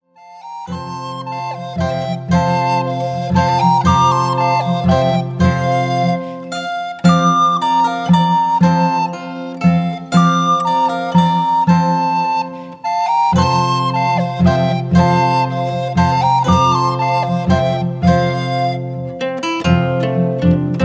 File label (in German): Landler aus Maria Taferl